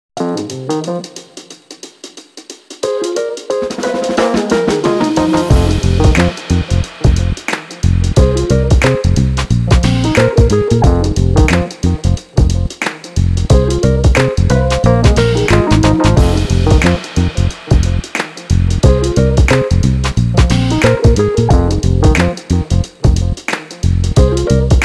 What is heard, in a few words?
Smoothjazz